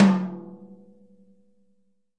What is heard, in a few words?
thin tom, Multisampled Real Kit; REAL LIVE KITS